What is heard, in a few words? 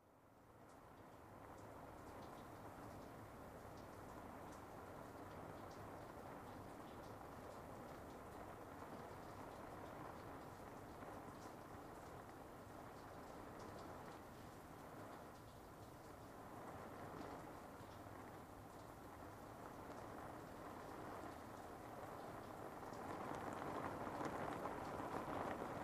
Rain On Roof Medium